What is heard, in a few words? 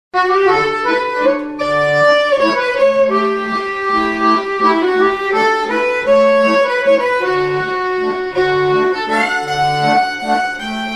Waltz, Fiddlers; Gervasoni Waltz No1 Or Clings Waltz